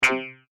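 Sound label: Digital Distortion Chime Click; Digital Clicks, Blips & Alerts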